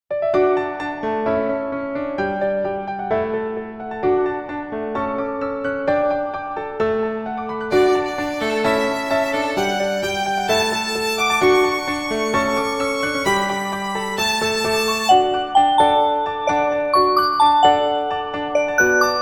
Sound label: ringtong; Gionee V185